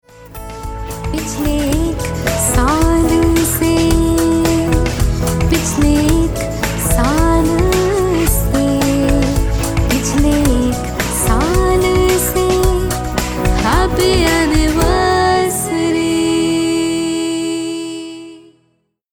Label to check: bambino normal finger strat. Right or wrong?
Wrong